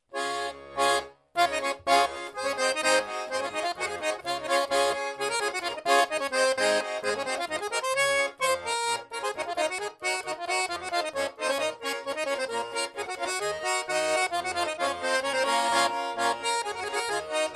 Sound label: Polka; Maladziozhnaja